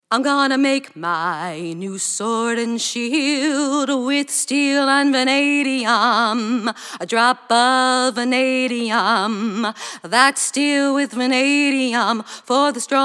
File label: Vanadium Element Jingles